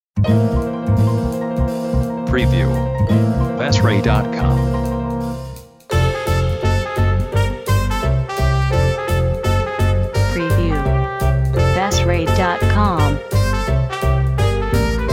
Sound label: Holiday Jazz